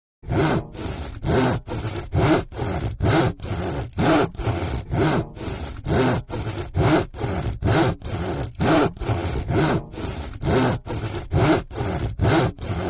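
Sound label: Handheld Saw Wood Close Up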